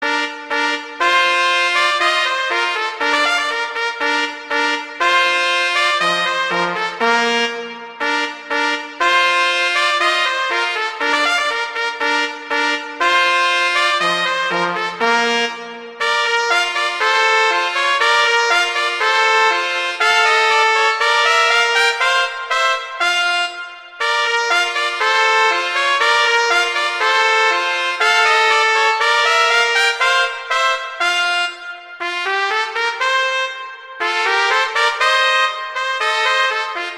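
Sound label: Duet, horn; Mozart; Duets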